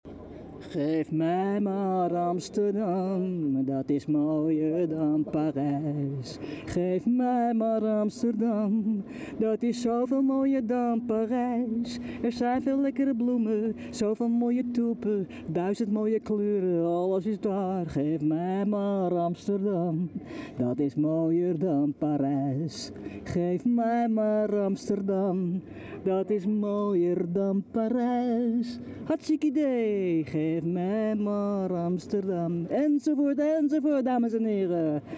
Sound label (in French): chant; amsterdam 2020